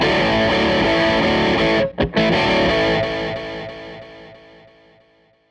Les Guitar Loop

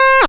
syn, Dance Synths, Acid Bubble; ProSamples vol.37; Best Service

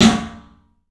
club snare, 3D Drums; 3D Sound

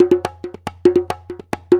CONGA LP 140

